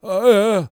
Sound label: World Voices, CHOCTAW